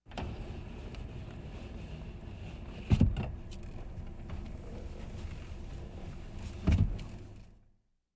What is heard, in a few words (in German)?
scheibe schließen (manuell), Auto; Auto-Zweiraeder